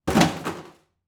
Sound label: dropping